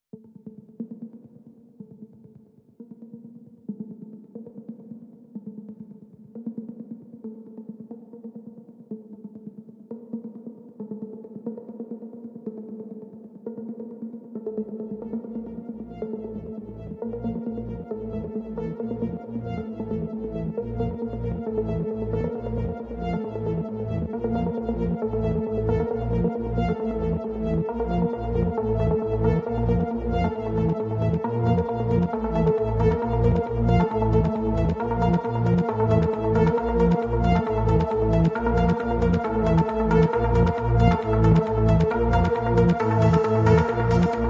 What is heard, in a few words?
Ambient